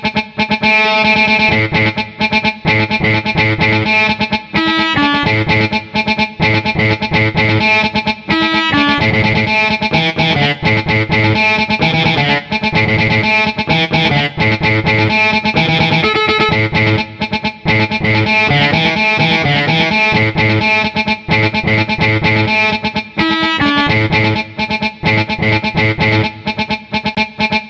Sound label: Infinix S5 Pro Ringtone